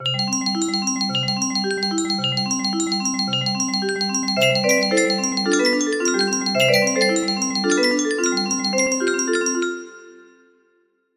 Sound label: melody, music box